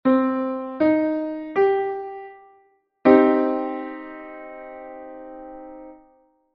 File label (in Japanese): 短三和音